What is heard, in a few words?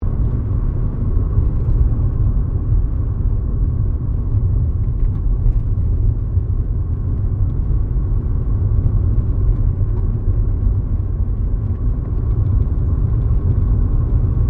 CAR SUBARU LIBERTY INTERIOR DRIVING LOOP; Family members Subaru; Vehicles